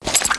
knife inspect start, Balisong; Balisong1; knifes

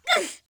hostage pain

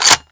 M40A1 bolt forward; weapons; INS2

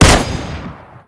awp

